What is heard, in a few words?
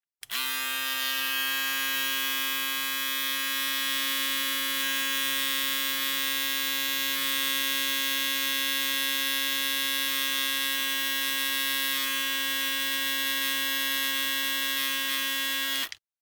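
sound of razor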